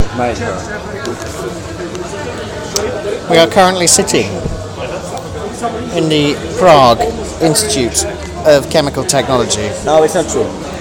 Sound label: in a czech library